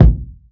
fat bass drum a key, Royality free kick drum tuned to the A note. Loudest frequency: 154Hz; kick drum samples